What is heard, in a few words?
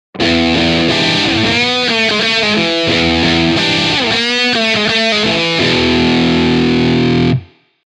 guitar Big Fuzz